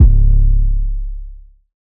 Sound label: 808 C#; Cymatics; Oracle Sample Pack; 808s